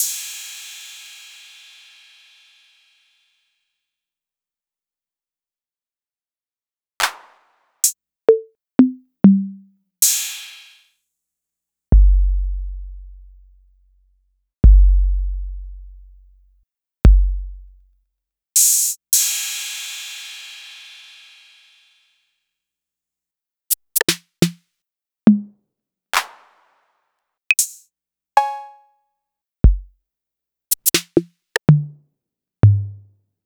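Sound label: Roland MV8800 drum TR-808mk2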